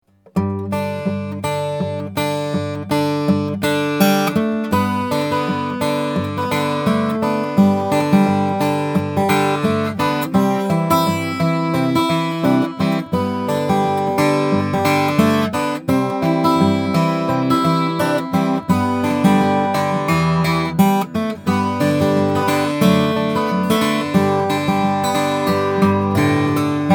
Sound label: Gibson B 45 12 custom; cherry sunburst